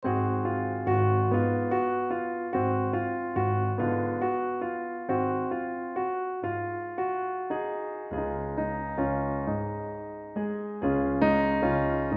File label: bass 1